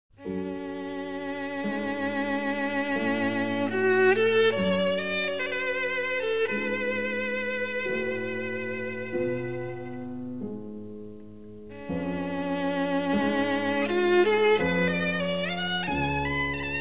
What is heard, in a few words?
Violin, A Major, Adagio; Suzuki Violin Method; Sonata N 1; G.F. Handel